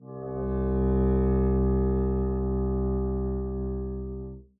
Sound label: arco c2 mf down, double bass; dsmolken